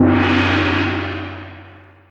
gong; lottharp

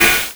cloud footstep; nyanland

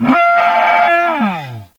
triceratops idle; paleotest

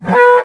triceratops hurt; paleotest

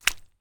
pizza walk; pizzaria

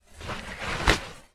rustle bag; prestibags

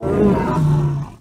smilodon death; paleotest

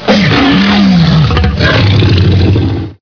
tyrannosaurus idle; paleotest

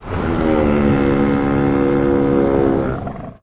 brachiosaurus idle; paleotest

